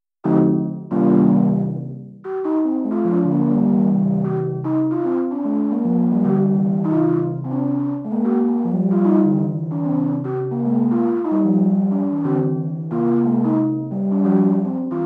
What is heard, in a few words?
polyrhy A4